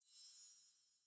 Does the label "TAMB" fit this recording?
No